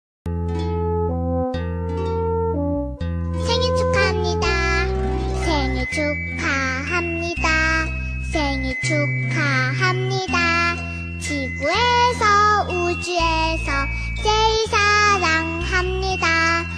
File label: happy birthday kids korean version